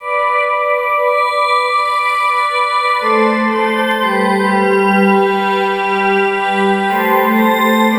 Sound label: Synt; SYNTH; 120 BPM